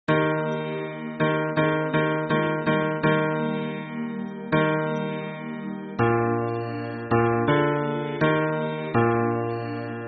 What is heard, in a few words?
hymn bass